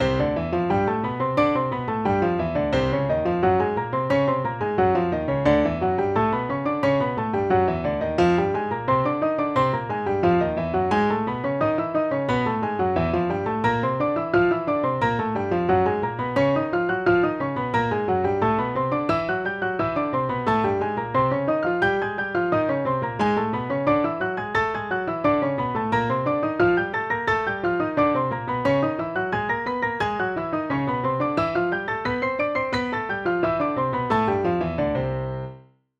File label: Octave Exercise